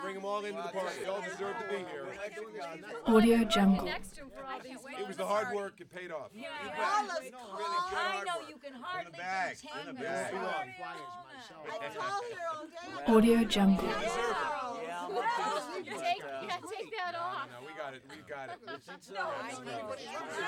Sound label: election party crowd